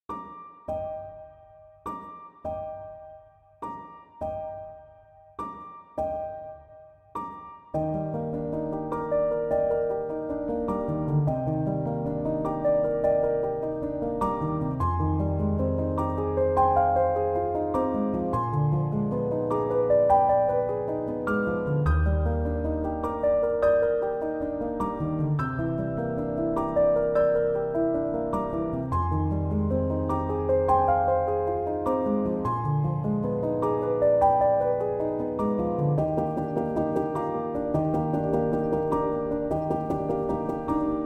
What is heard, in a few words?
piano instrumental